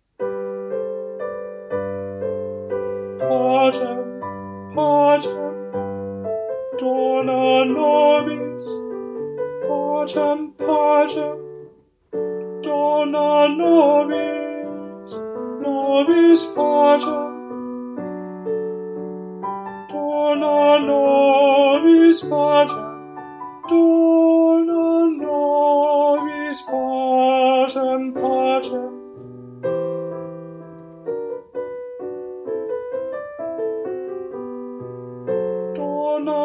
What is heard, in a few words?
Dona nobis Tenor